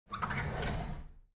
drawer big squeak open regular

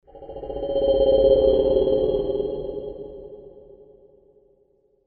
digi swell

